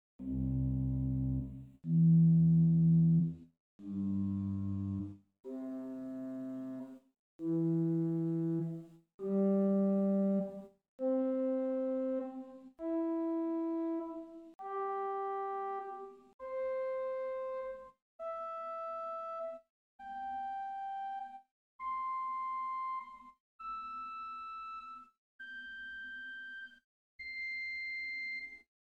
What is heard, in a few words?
Sw Gambe8 arp, organstops